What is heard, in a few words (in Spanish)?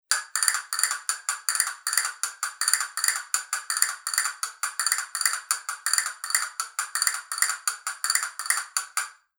fibra veteada doble caja, castañuelas